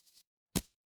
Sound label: paper fabric card flip